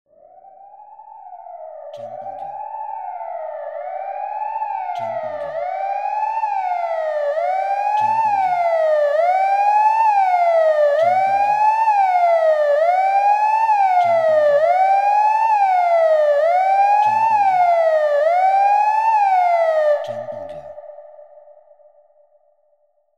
Alarm Sirena

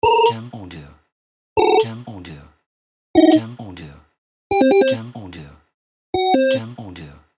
Retro 8bit

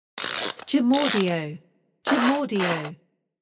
Clock Timer Spring Mechanism

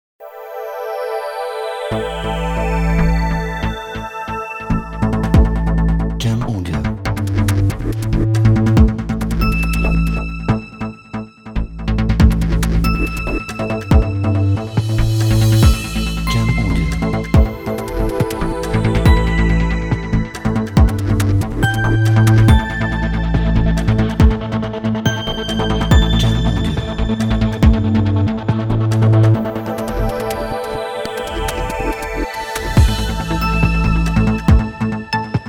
Technology Ambient

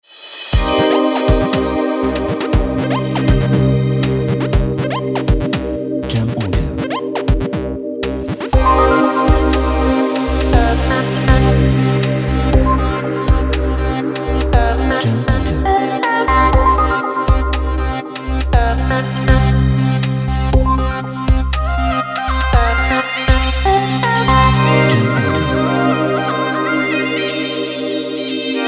Urban Chill Broadcast

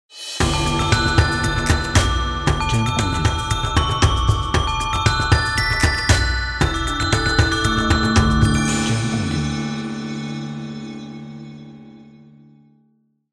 Asian Logo (Chinese New Year)

